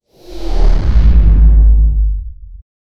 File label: dark magic charge up